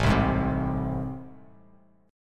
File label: A Minor Major 7th A down, Bass; strummed; AmM7; Bass Standard; JGuitar